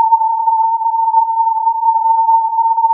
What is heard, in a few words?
filtered input